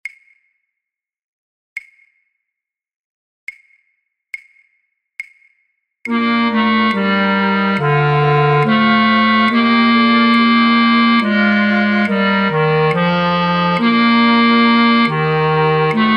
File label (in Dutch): Basfluit 1A, Basfluit 1, fluitorkest; voor oefentracks; Matthaus Passion